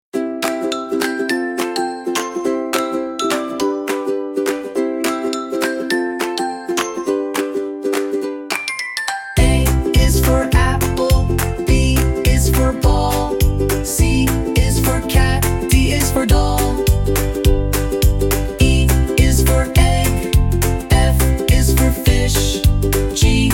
alphabet song

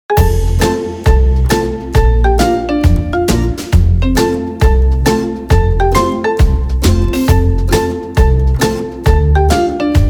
backsound

